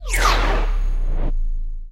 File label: free spin intro continue; goldentavern